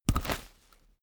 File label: umbrella telescopic close fast